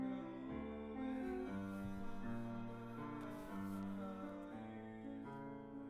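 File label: guitar A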